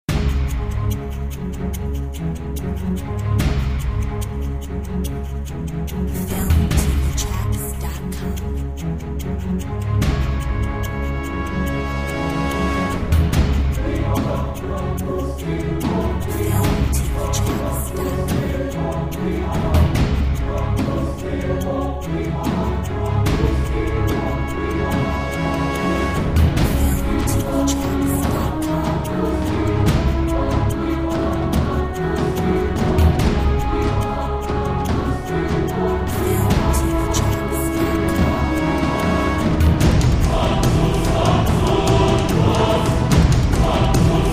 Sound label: Trailer